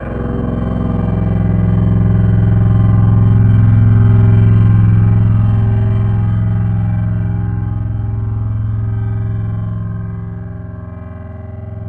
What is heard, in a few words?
Tambura F1; Synthetic's Serum Collection; Echo Sound Works Sphere